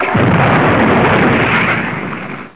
mortar hit

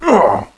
pain; caleb

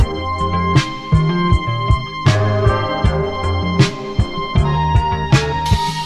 hiphop

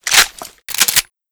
auto shotgun bolt forward; eva8

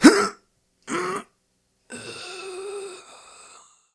death; police

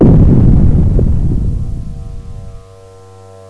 city blast furnace loop

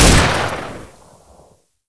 556 ar shoot

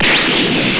burning fire, weapons

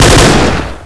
ak47 burst

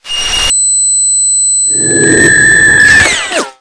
player ears damaged

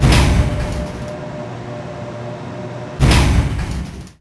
bridge move

